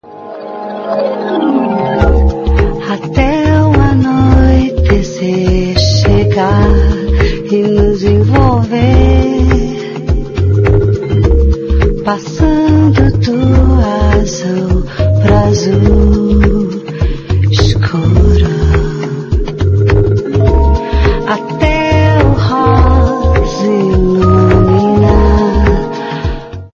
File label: vocal, chanteuses